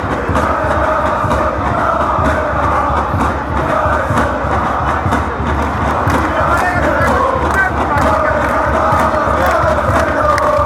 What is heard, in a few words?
olympiacos chant; ofc